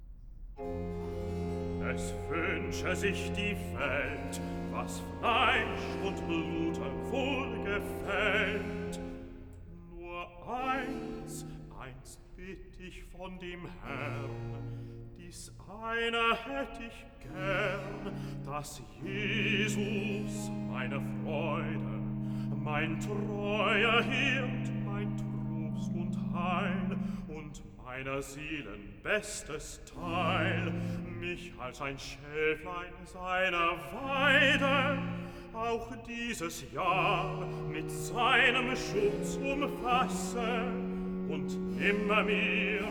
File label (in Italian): Recitativo (Bass); Cantata, BWV 190 'Singet dem Herrn ein neues Lied!'; Bach; New York; Sun after Xmas